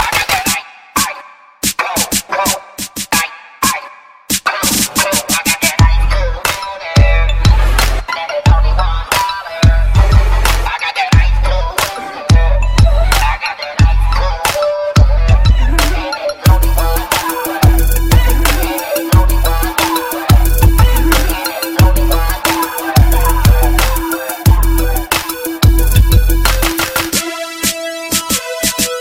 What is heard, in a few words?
Remix